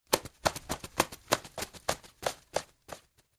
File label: Birds: Wing Flaps.